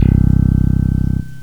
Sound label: Fingered Bass; GMPlay